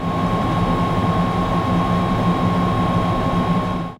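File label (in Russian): ventilyatory ohlazhdeniya, lunohod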